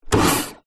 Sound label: industrial pressure washer k2 on run short burst no spray just power; kartcher; zapsplat